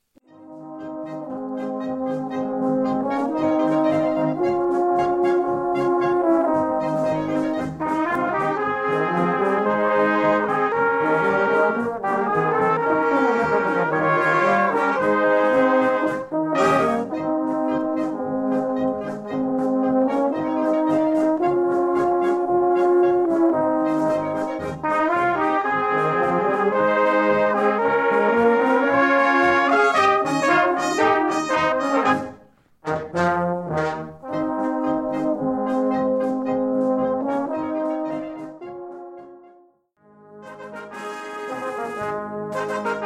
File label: Polka